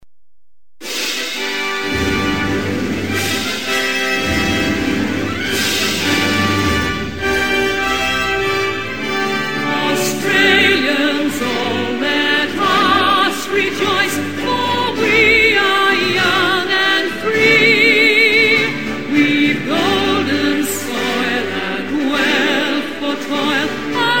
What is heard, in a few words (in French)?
Australie, Hymnes nationaux